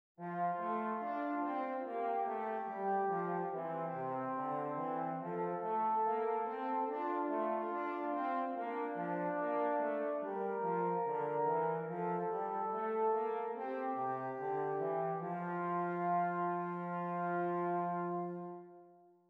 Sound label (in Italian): Esercizi di contrappunto a due voci; dritte Gattung; Ronge pagina 59